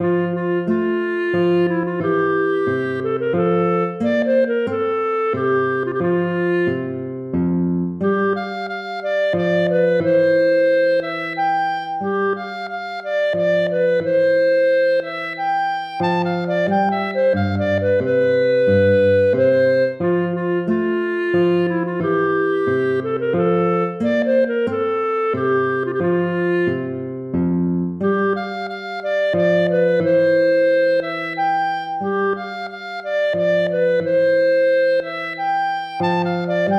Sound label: minuet; mozart wolfgang amadeus